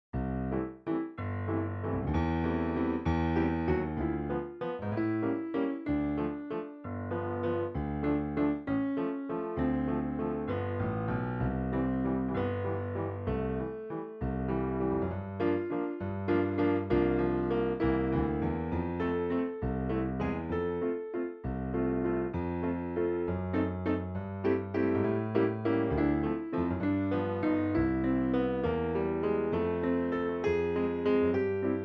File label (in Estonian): viini valss; standardtantsud